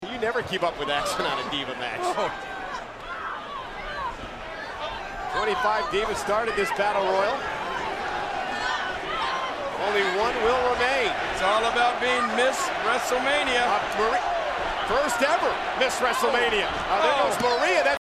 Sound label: chant; santino